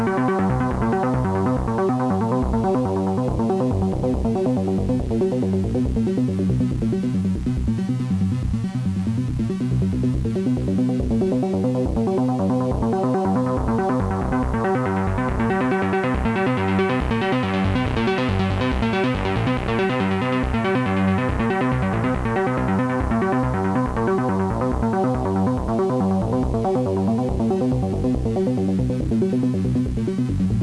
A124 sequence; Doepfer

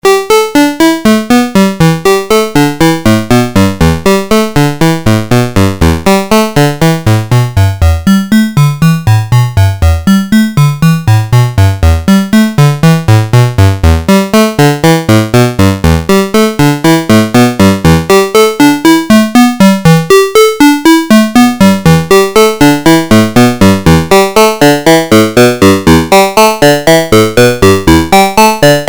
Graphic VCO, Doepfer; Patch Samples

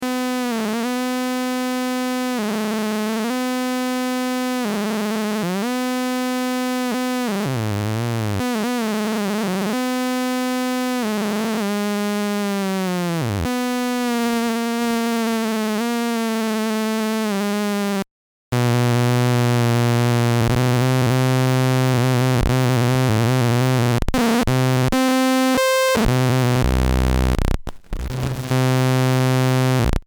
weird oscillator behavior; Troubleshooting

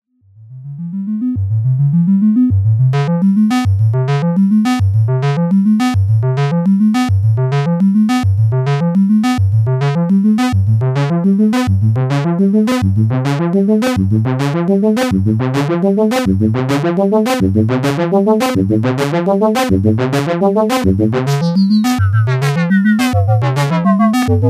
Morph Seq; Cyndustries

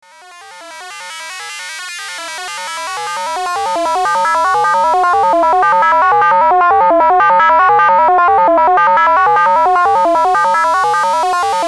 Model 15 VCO; PLAN B analog blog

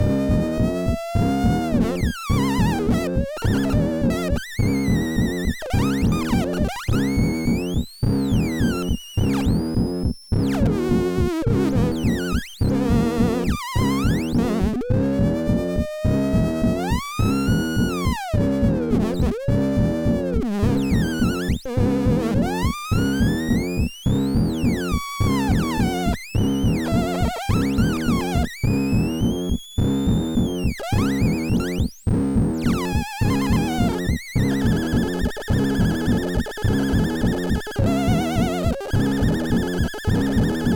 bass & squeal; SergeModular

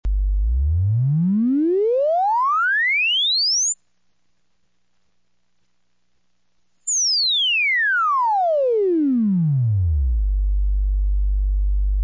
SINE sweep; PSIM-1

